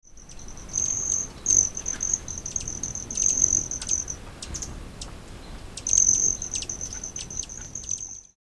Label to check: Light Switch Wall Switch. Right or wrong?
Wrong